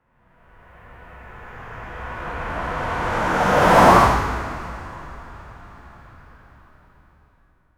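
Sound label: drift car pass by, street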